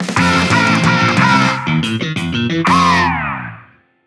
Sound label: Battle Victory Jingle